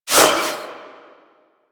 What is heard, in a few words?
Army Replicant Proj Throw; Warframe; Warframes; Hoplite